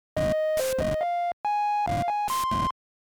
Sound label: Arcade game 16 bit or 8 bit level complete win success; Success Sound Effects; Video Game Success